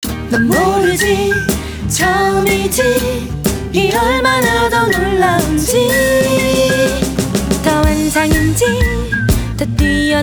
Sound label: ringtones; Optimus G Pro F240K Lollipop; LG optimus G Pro song